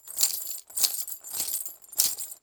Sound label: chain rattle